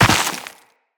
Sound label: Land Dirt, Footsteps; Characters; Tenno